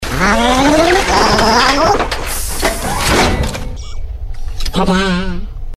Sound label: Tada; Vall-e